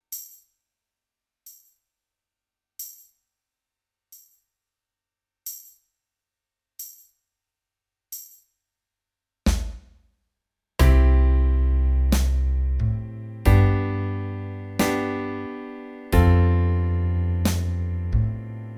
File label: down by sally gardens band; W. B. Yeats